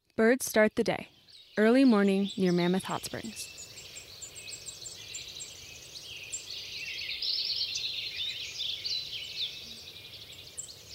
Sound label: dawn chorus; sound and light ecology team